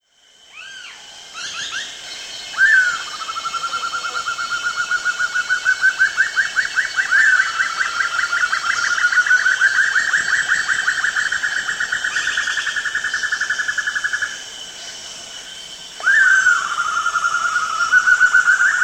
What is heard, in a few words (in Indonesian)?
sempur hujan rimba